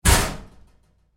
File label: Door Locker Impact Roomy Hard, Metal Door; Metal Doors